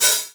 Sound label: Hat Synth Low